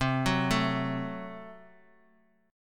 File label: F sharp Diminished C